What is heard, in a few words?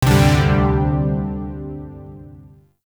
Shawzin Pent Maj Chord D; Warframe; Zariman Shawzin; Chords